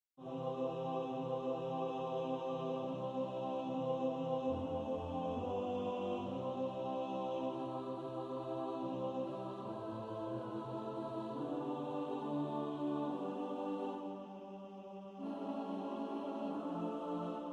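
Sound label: alto, practice music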